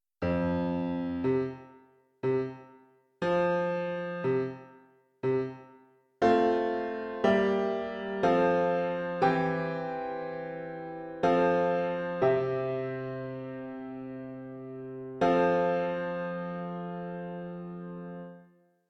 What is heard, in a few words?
Piano